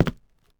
tile, Footsteps; civ13; civ14; Civ Effects

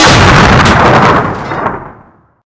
metal, aircraft effects; Vehicles

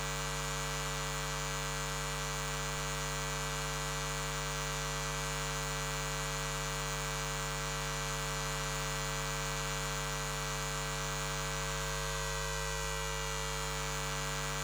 emf buzz; Ambience, Objects

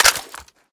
semiauto mag out; Weapons; Guns

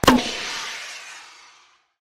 flaregun, fire; Weapons; Guns; civ14

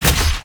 rapid slice, Weapons Melee; civ13; civ14

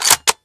bolt close, Guns

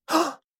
man gasp

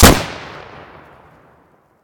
Garand, Guns Fire; Weapons

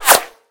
Bullet Whip; Guns Misc

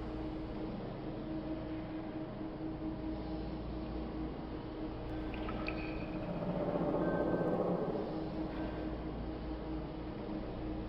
ambience; civ13; civ14

